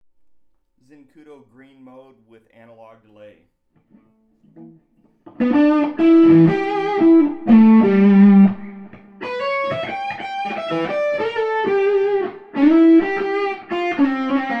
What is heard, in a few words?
Green mode with analog delay; Zenkudo